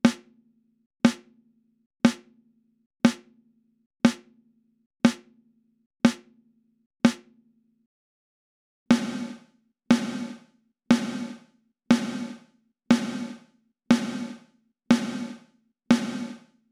snare dry gated verb, Snare dry, then with a tight reverb patch; Mixing for God audio examples